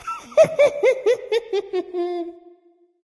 heh heh; smite; loki

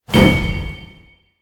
pet deploy; smite; vulcan

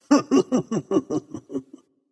heh heh; smite; janus

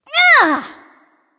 grunt attack; smite; aphrodite

